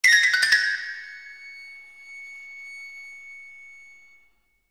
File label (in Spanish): trio fluido, tipologías; lachenmann